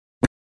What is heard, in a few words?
Fart Very Short & Wet Fart Close Perspective; Human Sounds